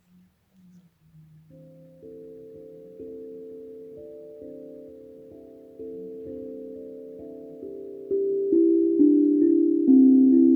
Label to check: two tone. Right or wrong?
Wrong